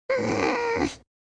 tap; baby marge

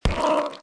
pet zombie squish

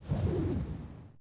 sfx currency tumbleweed; summer of our discontent; the summer of our discontent act 1 turbo tappin

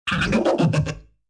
monster tap; burns

